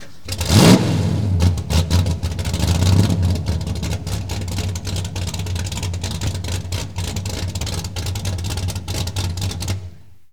Mustang GT350 Start Off, Automobiles

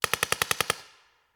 Paintball Gun; Sound Ideas; Guns

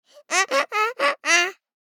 BABIES TALK Boy 12 months Donkey Like; Baby Boom

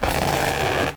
Stretch Twist, Foley Creaks; SFX Kit

